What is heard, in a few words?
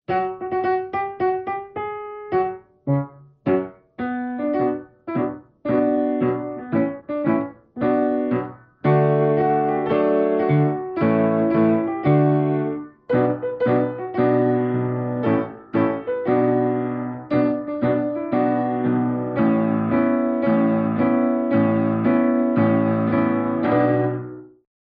instrumental